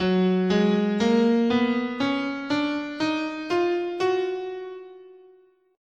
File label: F sharp Bebop Major, scale